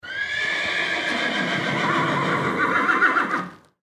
Horse Two Horses, Horse Two Horses Neigh Step In Dirt; Animal; Blastwave